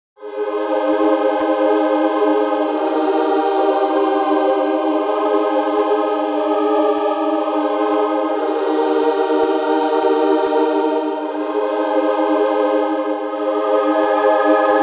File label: piano choir